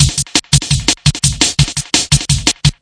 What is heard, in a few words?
170 bpm circle around, drum loop